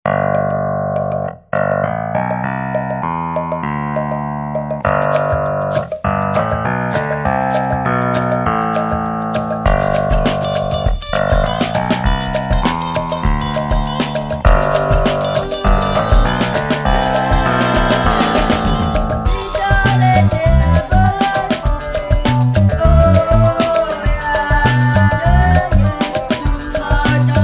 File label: Clavinet Reggae